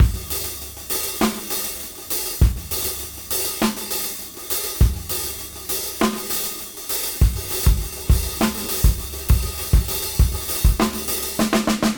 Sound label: Half Funk 101 BPM